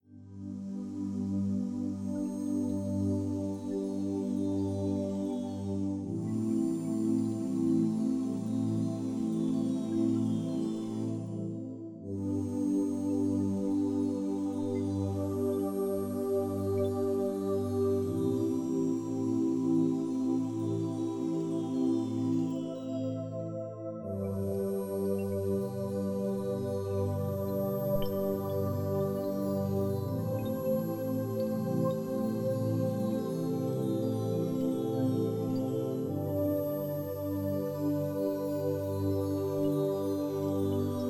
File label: My Quiet Place